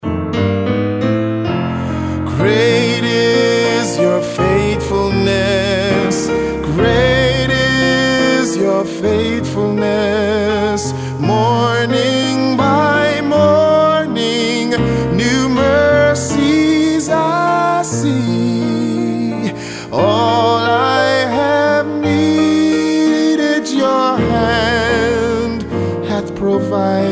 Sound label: ring tone